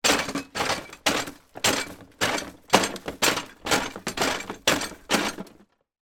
industrial hand cart bounce down concrete steps with boxes on; sound effects one